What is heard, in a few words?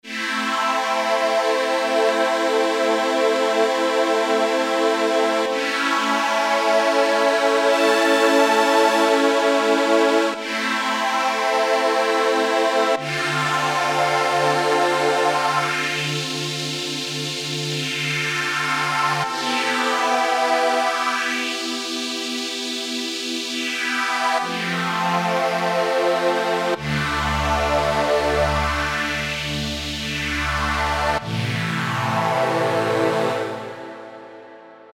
Pad Choir, Alesis Micron; Factory Programs; Aeon Choir